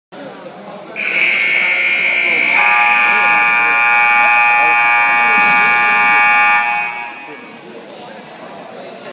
2 buzzers

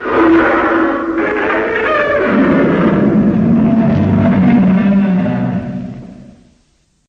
roar; Godzillasaurus

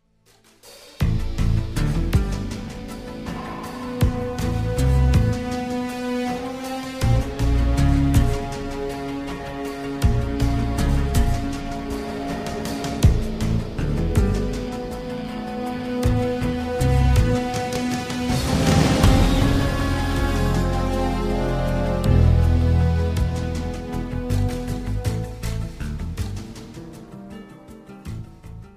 Faceoff Music

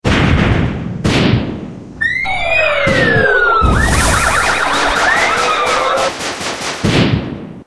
Blast And Sirens

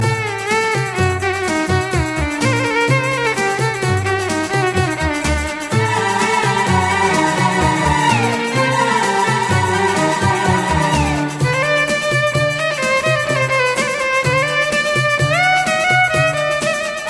Indian Dance

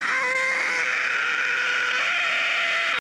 scream; Power ring

